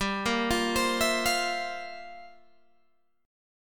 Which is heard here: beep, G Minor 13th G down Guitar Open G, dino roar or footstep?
G Minor 13th G down Guitar Open G